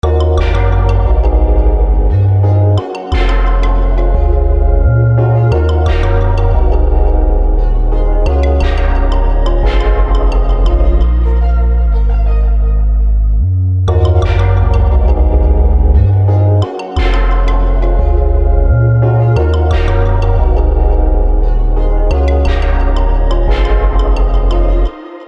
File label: Liquid DnB Songstarters